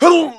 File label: hit, pain; mohaa